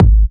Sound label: Bassdrum Clean